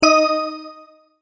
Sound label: multimedia tone correct; Tones (Sound Effects:Sound Design); McKinney Sound